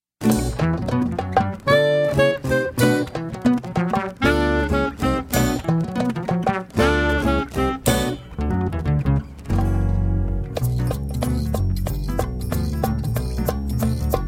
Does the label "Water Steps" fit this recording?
No